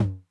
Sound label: Tom Low Accent Mid Tone Min Decay, 909